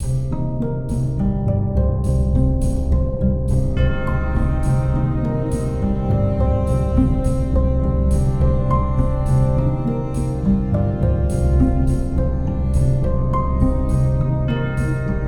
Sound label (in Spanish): Interludios para arpa y violín; Variación VIII; classical